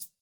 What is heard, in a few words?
hihat; Reference Beat BPM120; j city pop 1980